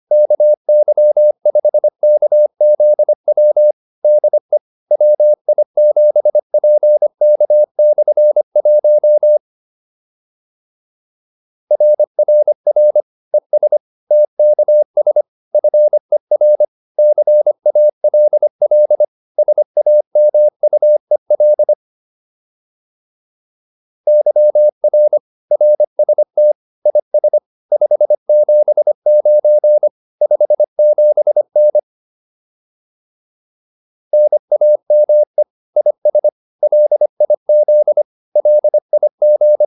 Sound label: QSO, advanced practice files